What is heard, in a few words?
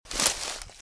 Footsteps - Bush walk - Left; Eden; RadwinIsland